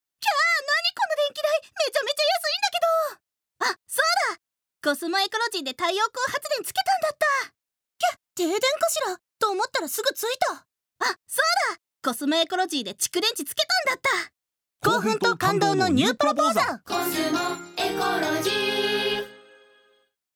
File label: radio